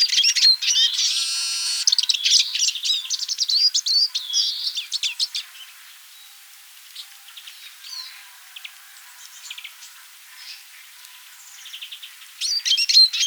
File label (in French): tarin chant